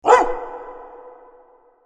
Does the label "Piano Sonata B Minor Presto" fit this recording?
No